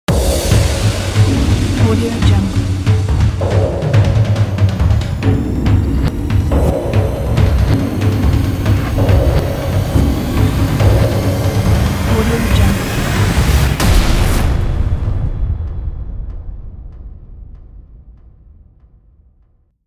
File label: cinematic logo ident; kipaloops